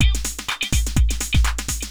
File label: DRUMLOOP HOUSE 125; eJay